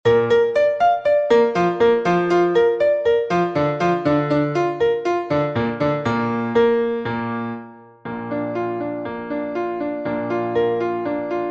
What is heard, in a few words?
Bach Bb Concerto 120